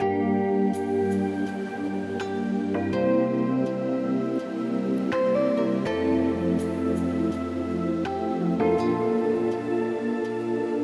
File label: Balearic Beat; LUXURY AMBIENT